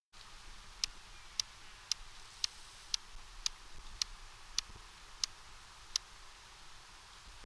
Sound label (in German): Stethophyma grossum Schienenschleudern; Mittelburgenland